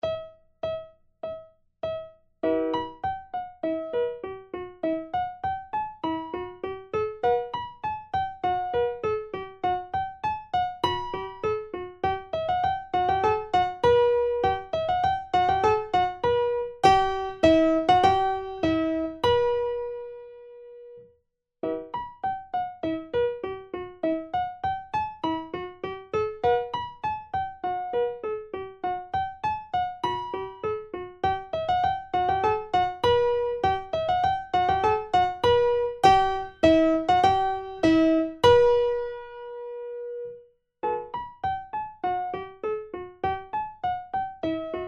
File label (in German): Primo, Vierhaendige Uebungsstuecke; Diabelli